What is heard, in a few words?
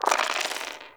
Roll Dice; Cetus Vendors; Five Fates